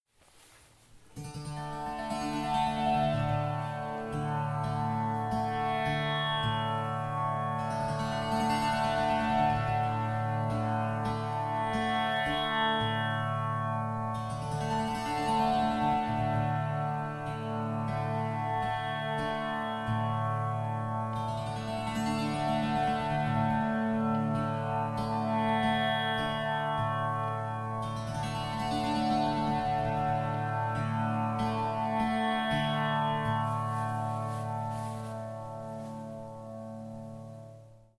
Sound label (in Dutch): Middelgrote Nada Tarangini; ganesh muziek dag nada tarangini en ekeshwara